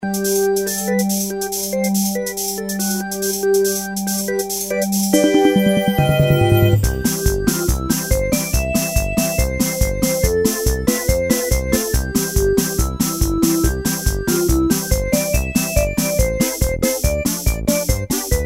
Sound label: Karaoke Version